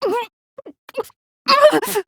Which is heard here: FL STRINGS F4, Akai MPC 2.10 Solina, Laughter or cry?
cry